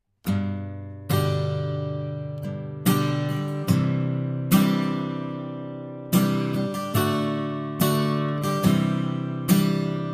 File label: AccGuitar - C414 Omni; edge duo srovnavaci test